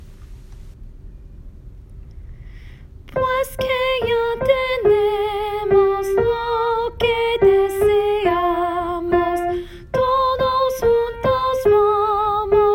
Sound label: solo